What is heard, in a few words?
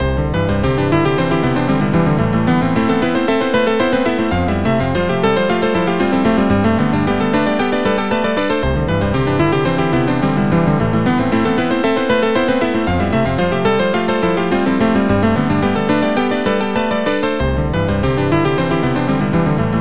Pianissimo